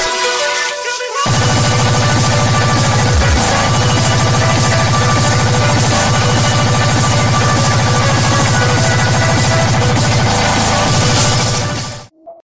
i phone x annoying ringtone

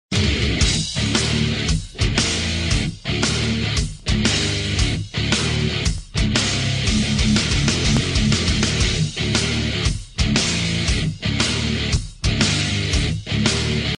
iphone 3g s rock ringtone

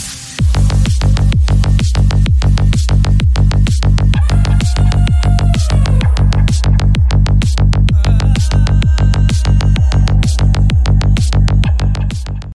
i phone 8 plus ringtone

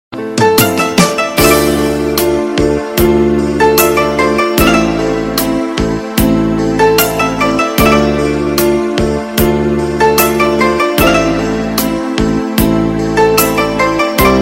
light music ringtone

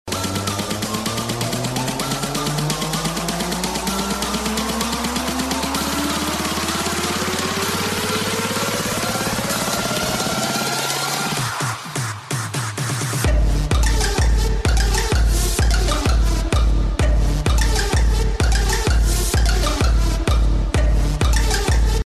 fast drop ringtone; iphone 6s plus

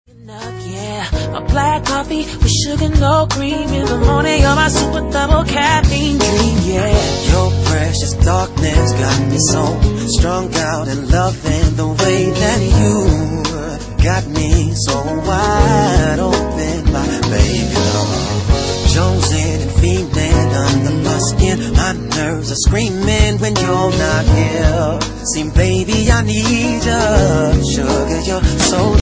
iphone 4 classic ringtone

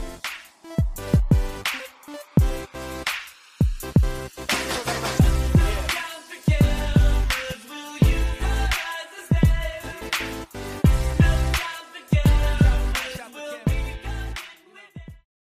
new vocal ringtone; ipnone 4s

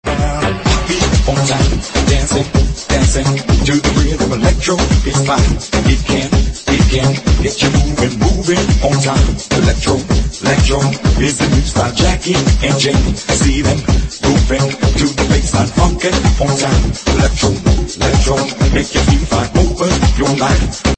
elektro iphone 6s remix ringtone

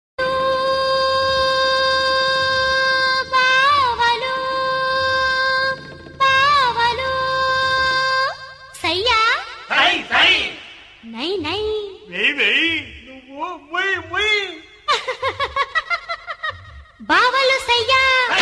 huawei flute music ringtone

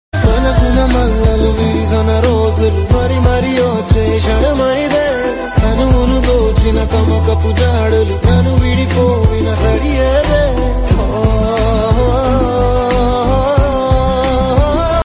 huawei guitar

